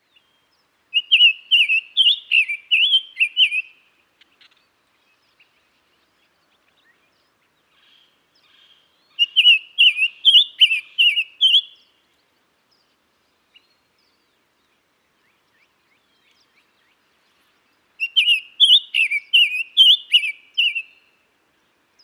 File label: summer tanager